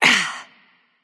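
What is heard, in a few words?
grunt hit, female; smite; voicepack; hera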